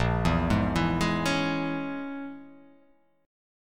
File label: Bb Minor 7th Flat 5th Bb, Bbm7b5 chord; Bbm7b5 arpeggiated